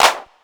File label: Clap, Ensoniq ASR-X Pro